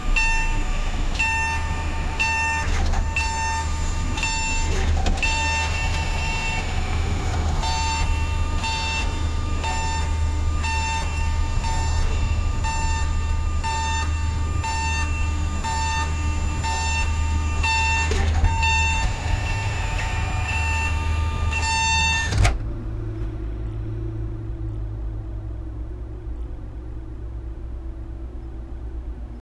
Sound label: electric forklift reverse